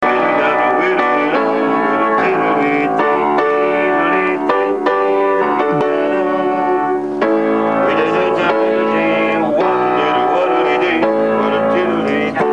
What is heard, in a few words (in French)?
Improvisation au piano; Coulises Bercy